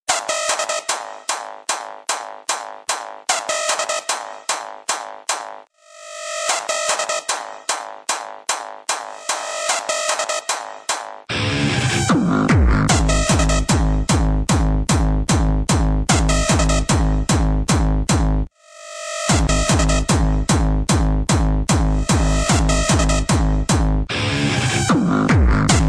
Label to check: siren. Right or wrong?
Wrong